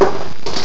THROW; BRAIN ACTIVITY

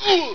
splat; zombieii

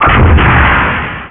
MISL LNCH

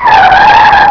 SCREECH; GREAT ACTION; SKIDMARKS 2

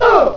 UGH

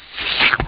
hit

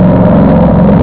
ENGINE; SKID

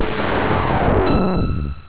FAILURE; BARTQST

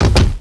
TING

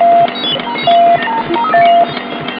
Computer

